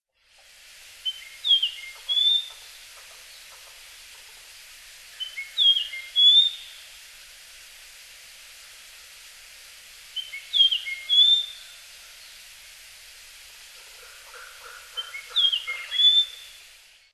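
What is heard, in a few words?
Japanese Grosbeak, Hyogo; ikaru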